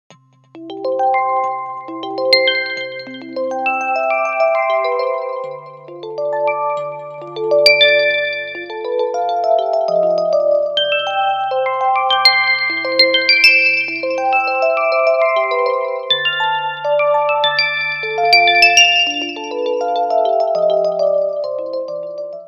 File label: Raindrops, ringtone; SKY IM-U680L; Poetic Melody